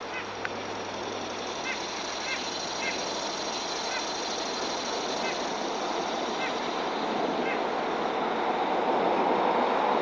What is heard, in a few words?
bird